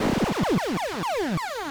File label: bubbles